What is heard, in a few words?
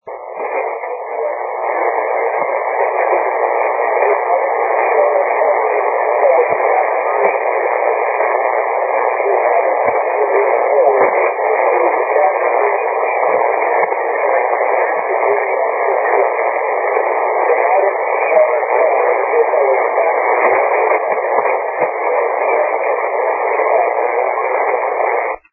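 80m long path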